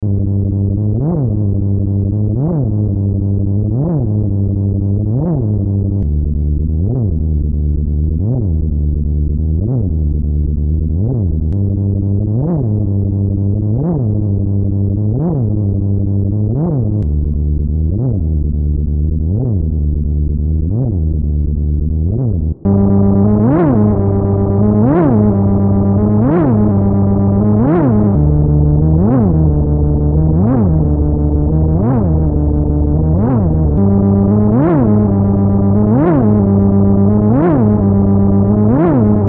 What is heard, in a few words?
Arturia CMI V Additive